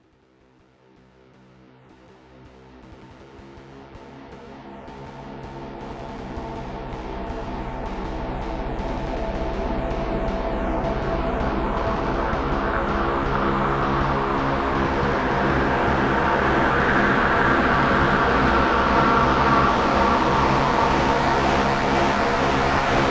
noise drum

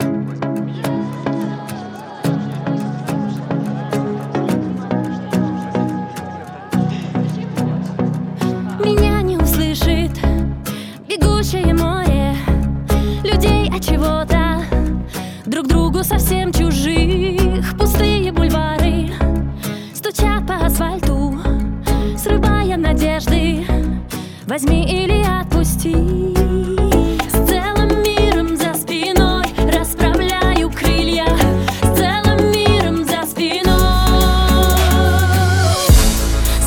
tango

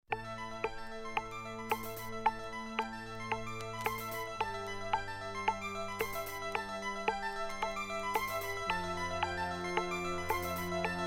tubular bells

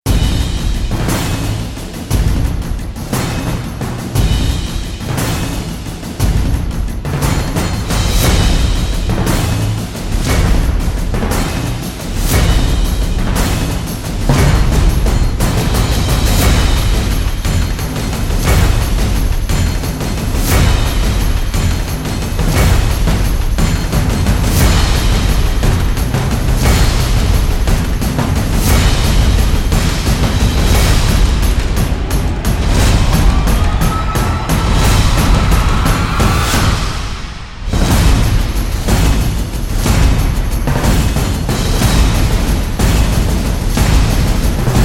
drums fx

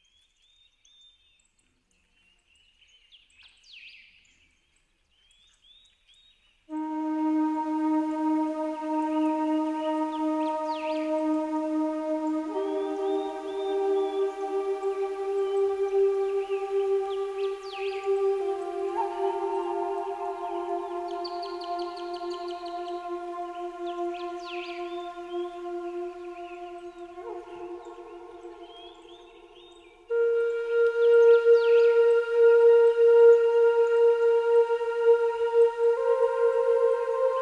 flute and birds

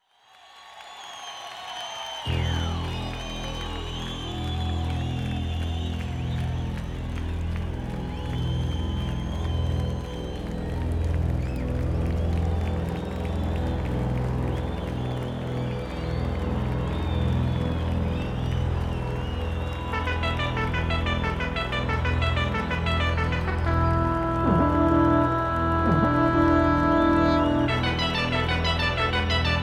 introductory fanfare live

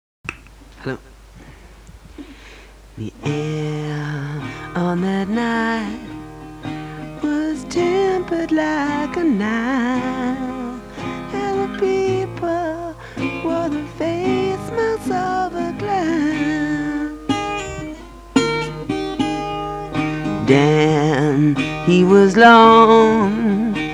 acoustic

